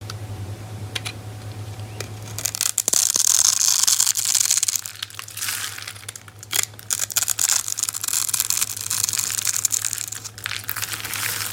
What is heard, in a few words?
soap asmr; خرد كردن و بریدن صابون